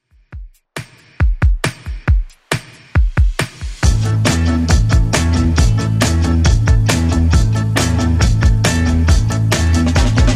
60s redrum 137 bpm

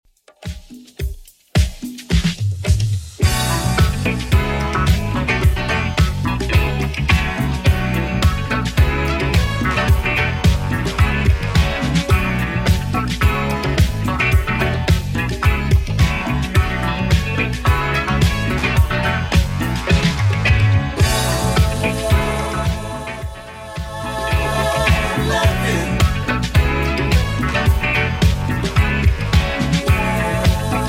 80s Redrum 108 bpm CLEAN

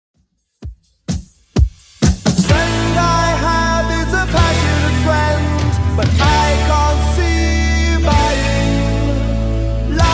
80s Redrum 128bpm

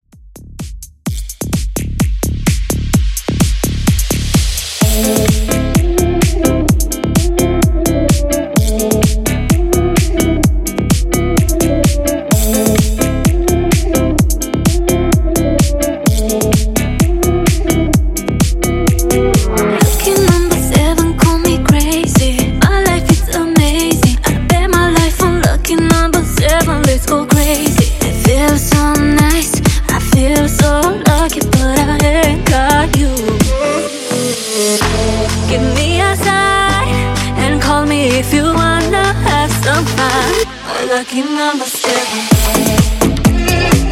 Bounce, 128 Bpm; Bootleg

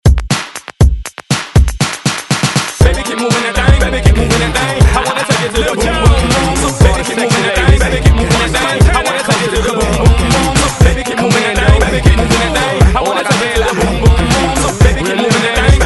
20s Hype ReDrum 120 Bpm

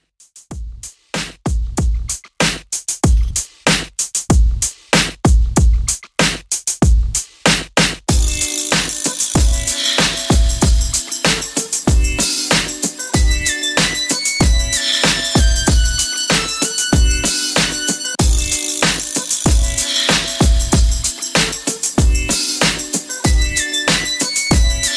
Classic Redrum 95 Bpm

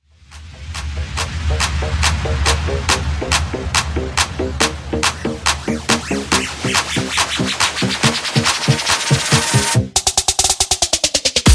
Trap Bootleg; Mashup

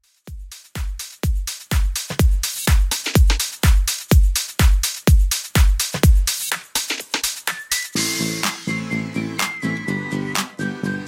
extended club mix 125 bpm

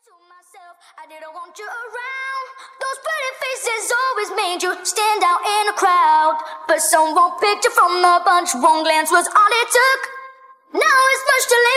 Acapella Intro

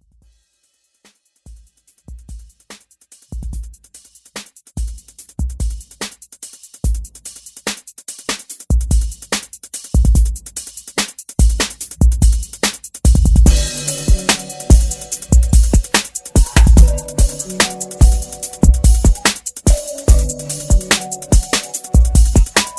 Redrum

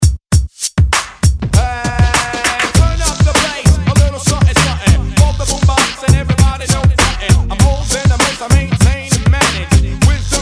90s Re Drum; DJDOLLS

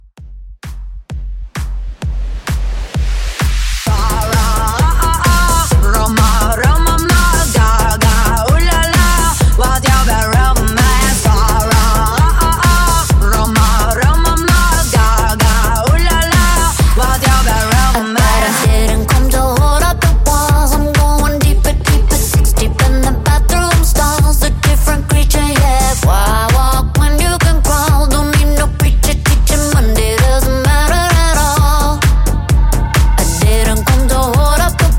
Club Hype

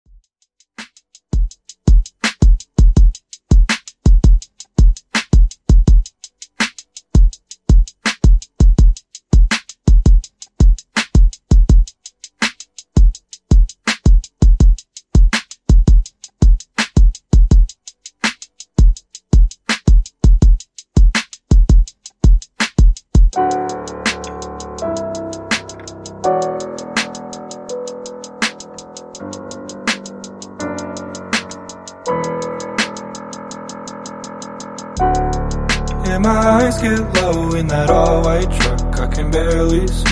Mixshow Intro 170 Bpm

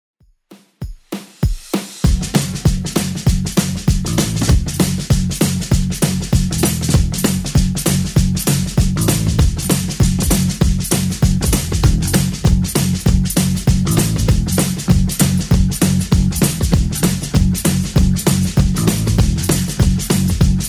70s Redrum 196bpm

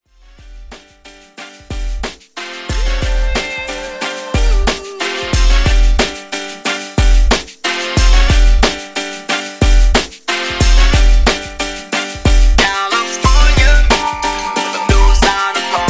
90s Hip Hop Redrum 91Bpm

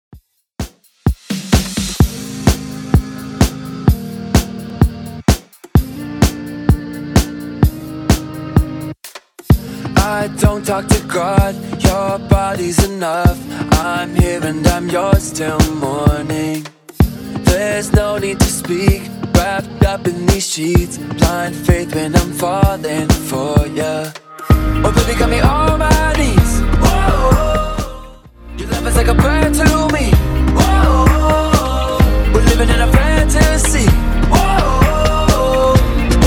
Top40 Redrum 128bpm

